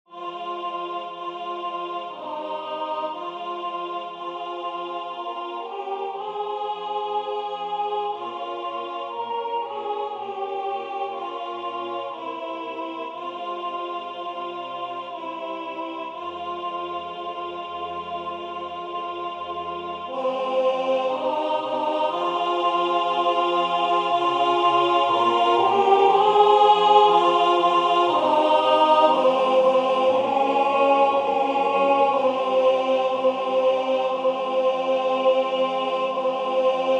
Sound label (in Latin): Hoc est Corpus meum alto